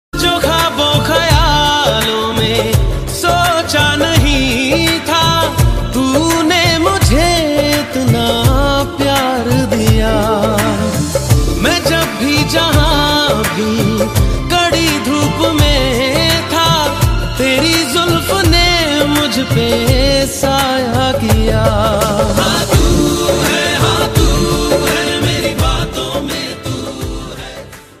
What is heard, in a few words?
bollywood emotional ringtone